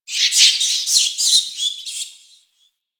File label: Forest Distant Medium Creature Small Cranky; Warframe; Grineer Forest